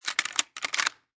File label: Rifle Lever Action Rack; Gun Handling